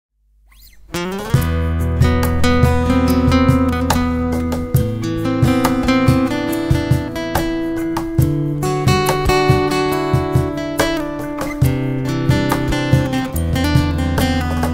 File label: Instrumental Guitar Version